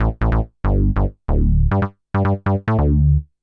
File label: SNTH BASS ELCTR 140 A, Techno